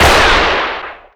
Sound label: revolver engi shoot; tf2classic